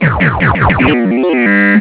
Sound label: win